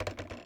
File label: Audio-Log-Player Stop